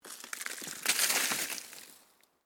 SNOW FALLING FROM TREE STEREO, Snow falling from trees in a mountain forest; Weather